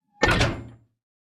copper chest close

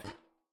step, copper golem, oxidized; Minecraft

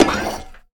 hurt, copper golem, oxidized; Minecraft

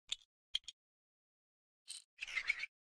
no item no get, coppergolem; Minecraft

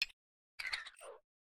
spin, copper golem weathered; Minecraft

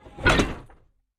copper chest oxidized close

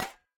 step, coppergolem; Minecraft

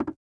place item, shelf

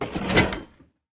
copper chest oxidized open

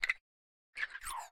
spin, copper golem, oxidized; Minecraft

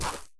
place, dried ghast

